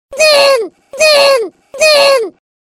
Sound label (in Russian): dzin dzin dzin, budilnik